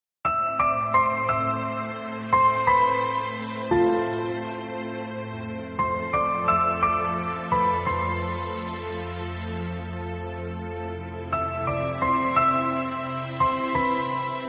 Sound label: Mobile Ringtone